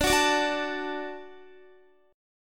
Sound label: D Major, D, down, Guitar; strummed; 4E,4A,5D,5G,5C,6F; chord